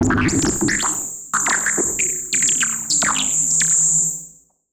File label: Malfunction Synth, malfunctioning synth samples